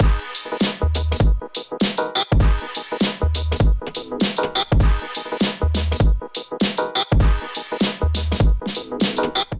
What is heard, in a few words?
Beat 100 Bpm, Drums Complex; MIDI Clips; Jiggy; Ableton; Chop and Swing